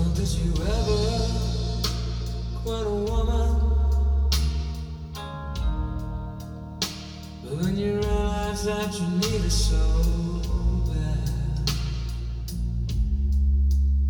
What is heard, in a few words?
blues